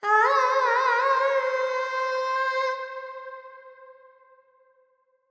Verb Charukesi, Carnatic Female Alaps

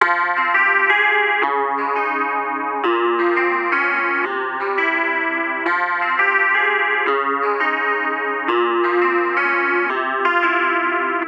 Clavinet Mysterious Chords Fm 85; Sample Banks; Melody; Keyboards

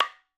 madalam notes R; MADALAM 110 7 8; Sample Banks; Percussion; MADHALAM

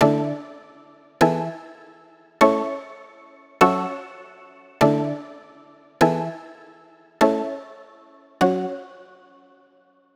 Pluck Attacky Chords F#m 100; Melody; Keyboards

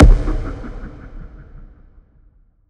FX Impact; Sample Banks; Percussion

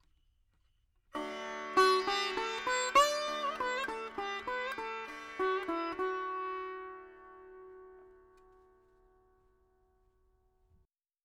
4 4 D 100 Dry, Melody Veena; 3-4; Sample Banks

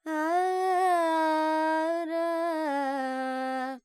Charukesi, Vocals, Carnatic Female, Alaps, G, Dry

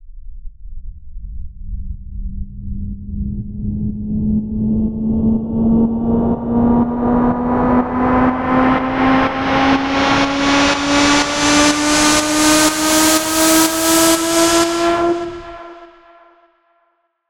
FX Uplifter; Sample Banks; Percussion

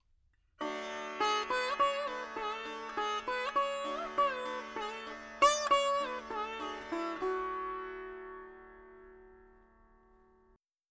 4 4 D 100 Dry, Melody Veena; Sample Banks

